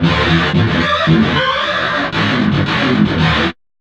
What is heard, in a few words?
GTR LOOPS